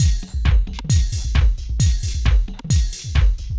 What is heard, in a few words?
thump 133